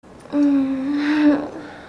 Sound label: mmm hm